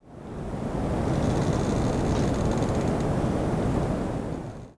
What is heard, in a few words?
wind TREE; The Elder Scrolls III - Morrowind; Morrowind GOTY GOG